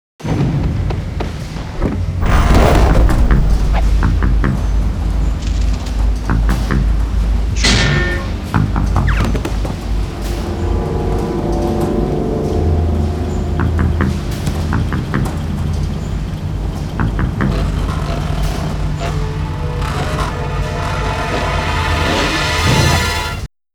Barrel Ride; Oddworld - Abe's Oddysee; PS1

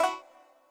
Collect ! Honeycomb; Banjo Tooie

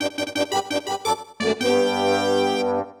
Race Fanfare; Nintendo 64; Super Mario

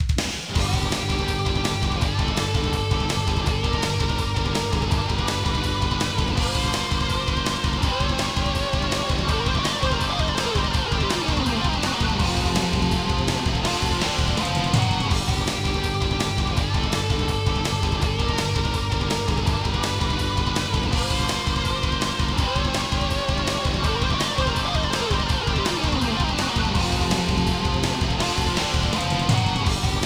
Goal BGM; F-Zero X

